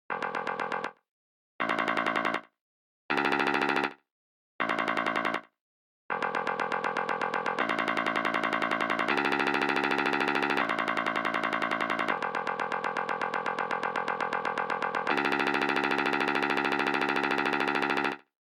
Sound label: E Bass Guitar Flutter